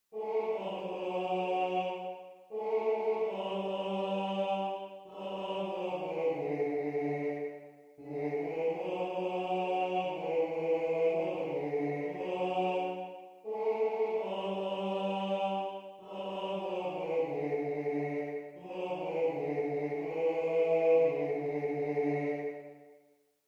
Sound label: TTBB BASS with other voices audition excerpt